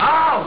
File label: ough; Amiga Soundtracker sample